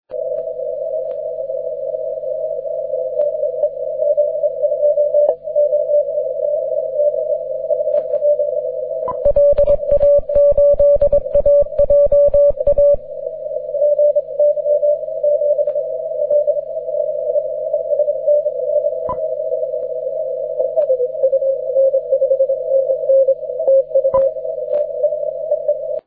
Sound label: cw; 30m